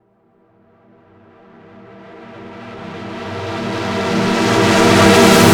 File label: Tense Swell; KJ Sawka - Drum n Bass & Beyond; Swells